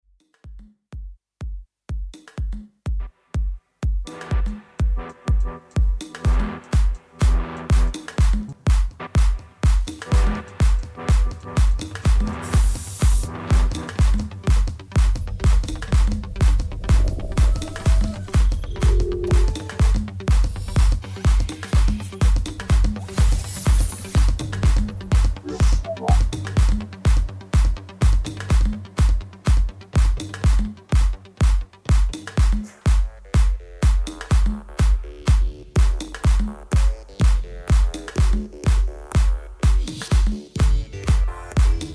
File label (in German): Musik